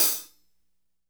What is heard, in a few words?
hat slosh